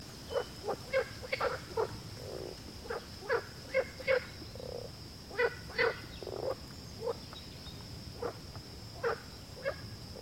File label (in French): grenouille